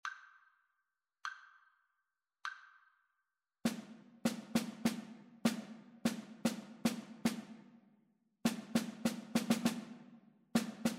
rhythmic dictation

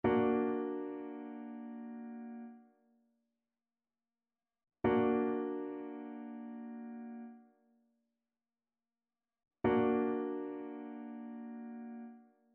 trichord; trichord identification

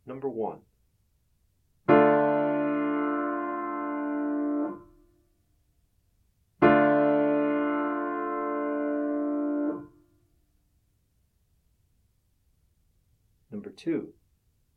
chords; eartraining

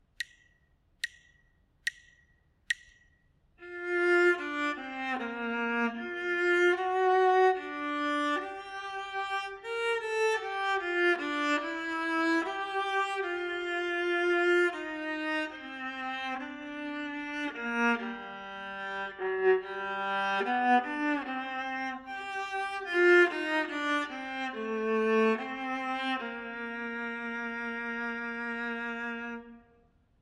melodic dictation; eartraining

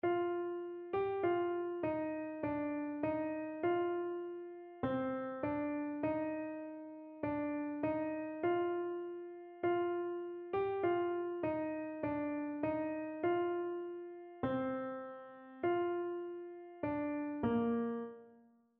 mode identification

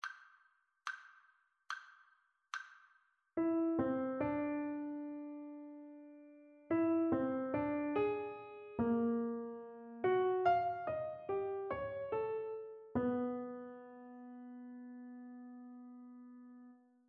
melodic dictation; ear training

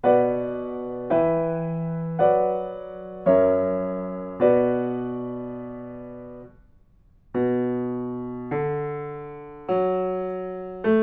melodic fragments

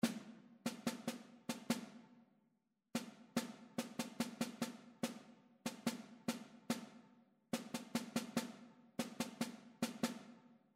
rhythmic error detection

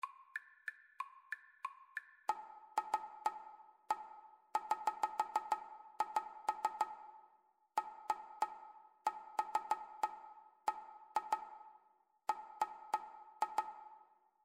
rhythmic dictation; ear training

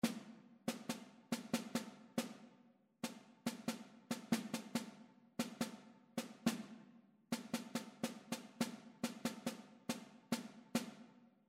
rhythmic error detection

